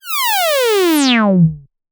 Zap, PsyTrance

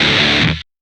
guitar; LiLA'c Records PsyTrance Sounds 01

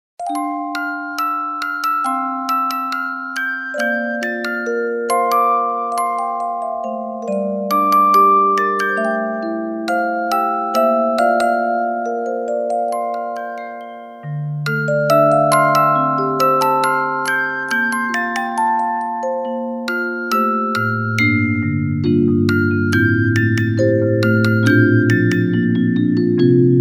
Music Box Ver

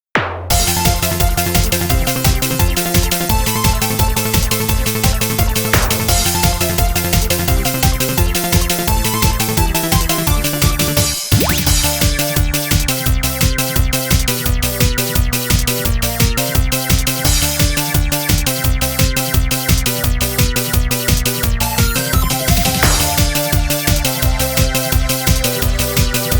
off vocal

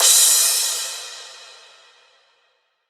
Crash

